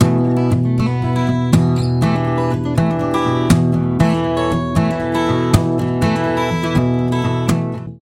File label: echo chamber plate reverb